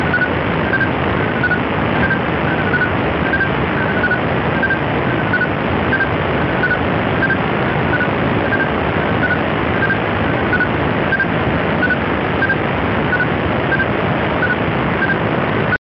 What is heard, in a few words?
Hyperbolic Radio Navigation System